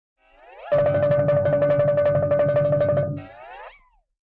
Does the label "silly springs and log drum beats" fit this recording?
Yes